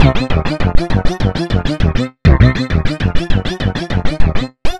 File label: synth arp; Electrocution